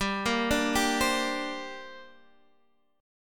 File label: A sharp 6th Add 9th